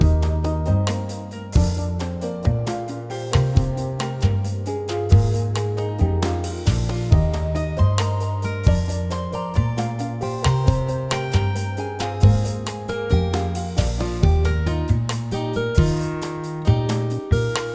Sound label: menu music; Animal Planet Vet Life; NDS